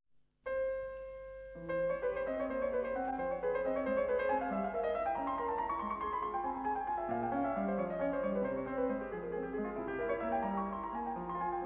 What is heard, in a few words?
Etudes F minor, piano